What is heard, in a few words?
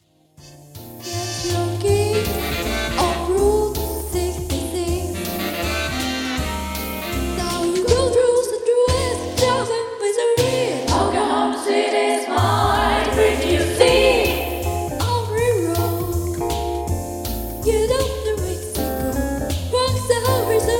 kids JAZZ, piano